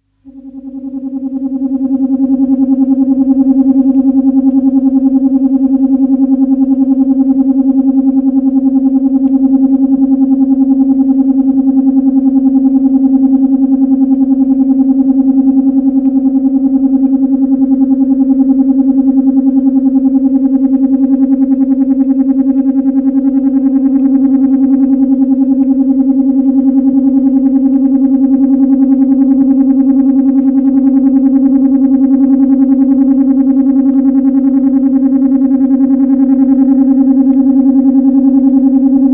Warble Frequency Notes, 480 Hz (Approximate frequency); Deep Tone; BBC